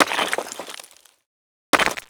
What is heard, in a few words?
Resynth Stone Break